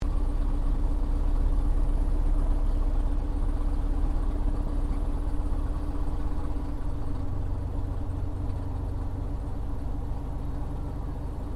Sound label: AIRCRAFT CESSNA O-1 BIRDDOG SHUTDOWN INTERIOR, spotter aircraft; Airplanes; Vehicles